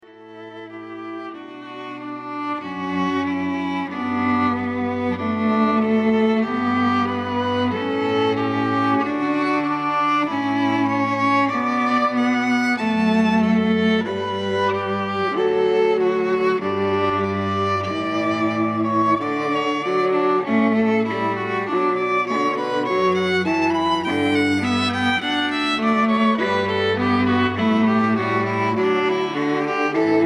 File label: String Trio; Kent